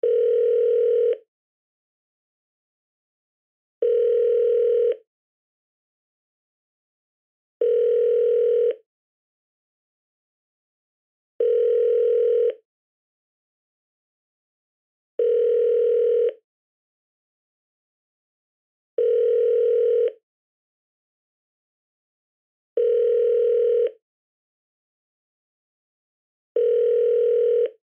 Telephone Ring Loop; Office; Telephone Ring Speakerphone Loop; Telephones; Blastwave

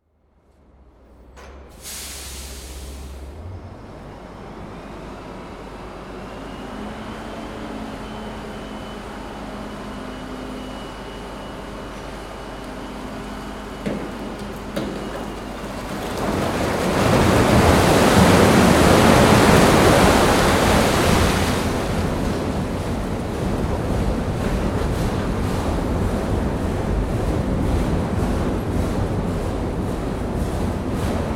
Crushers; BBC

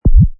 Wippa, Button sound; Multimedia; Button sound, wippa-1; Buttons (Sound Effects:Multimedia)

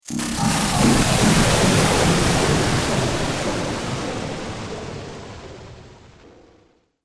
Droid Power Down, Robot Sound Effects

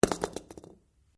Stone On Stone Small Impact; Material components for constructing more complex sounds; Drops; Objects

